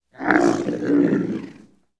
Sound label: orc die